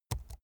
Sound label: keyboard laptop key press slow soft